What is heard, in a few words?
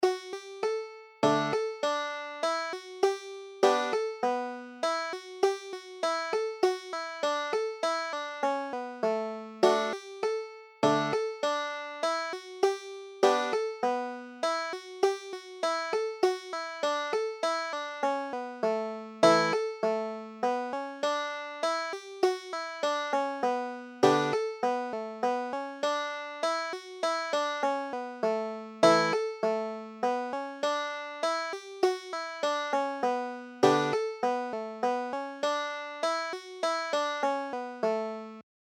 cider, banjo